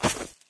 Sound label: dirt, player footsteps